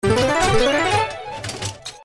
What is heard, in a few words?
robots win